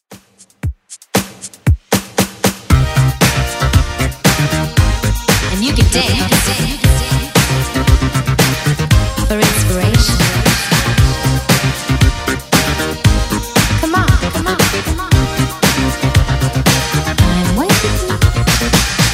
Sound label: 80s DJ Friendly Redrum 116bpm